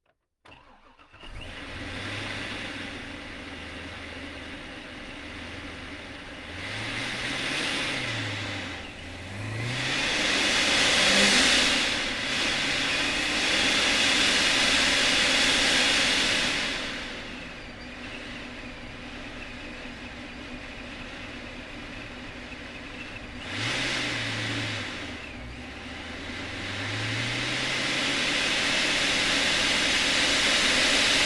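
corvette c6 onboard start medium drive stop reverse stop drive stop off engine; Sonniss GDC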